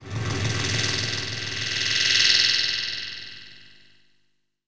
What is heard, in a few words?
Headless Dly; Jungle; Blu Mar Ten - Jungle Jungle - 1989 to 1999 Samplepack